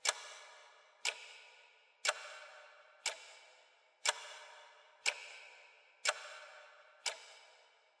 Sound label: Clock Second Hand (Reverb Loop)